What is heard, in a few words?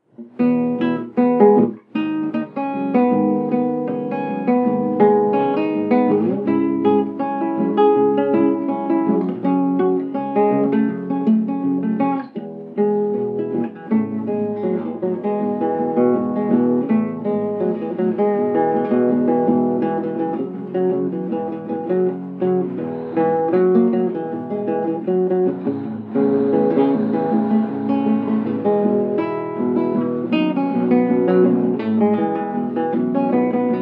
instrumental